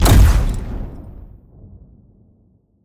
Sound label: robot explosion; fight